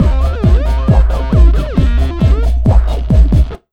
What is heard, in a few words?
Big Beat Drumloops, LOW 135